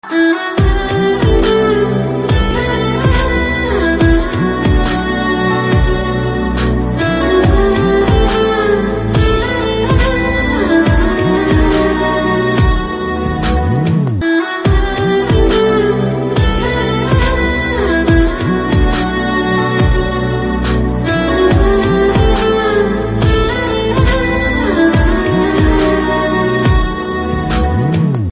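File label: background music, Telugu Ringtone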